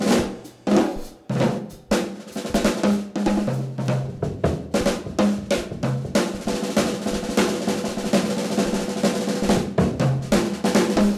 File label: drums rest CEEMD